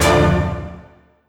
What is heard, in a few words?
symbol hit scatter